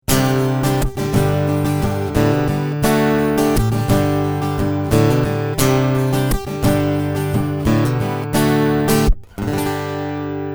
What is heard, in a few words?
bass treble